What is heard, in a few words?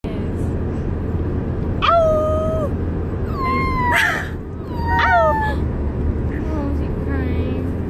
howling